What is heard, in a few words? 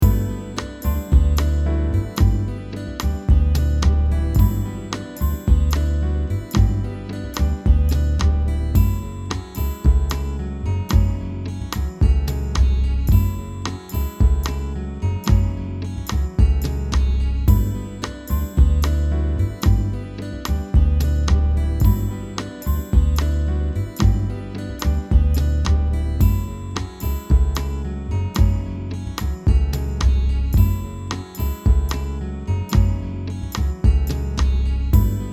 bossa 110